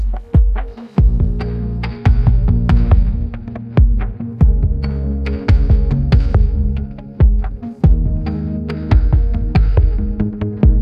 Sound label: lo fi hip hop mellow piano, ringtones